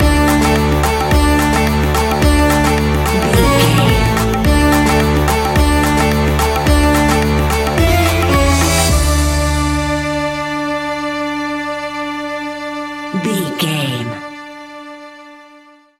indian ambient music cue, An exotic and colorful piece of World music. Groovy, powerful, exotic and wild.